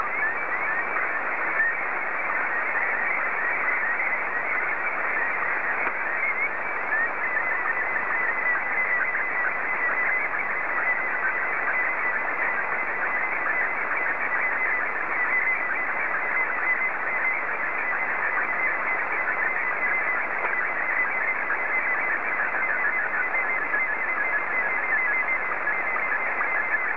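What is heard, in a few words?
SSTV, Martin 1; KK4WJF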